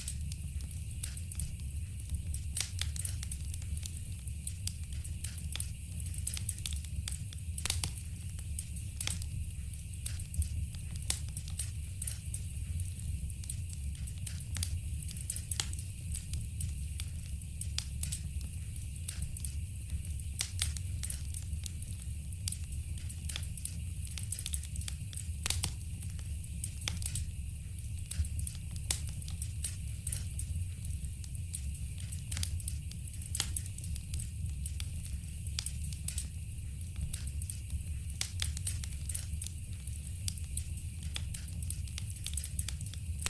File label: Burning Fire Places Sounds